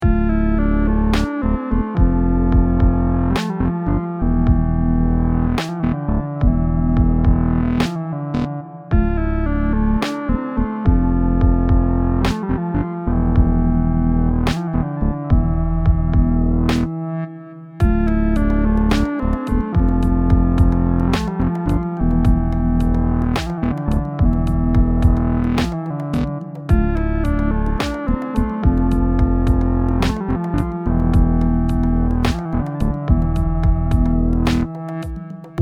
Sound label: synth